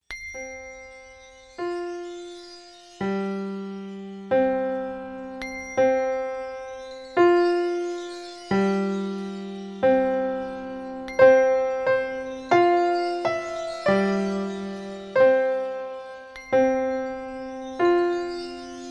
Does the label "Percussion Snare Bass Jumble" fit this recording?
No